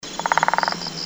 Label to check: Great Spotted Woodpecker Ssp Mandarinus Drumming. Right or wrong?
Right